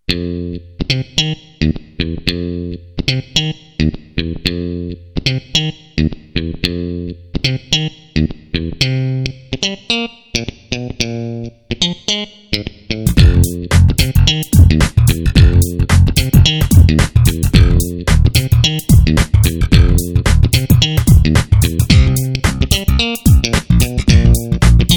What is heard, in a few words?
Brief Funk Guitar Interlude; Electronica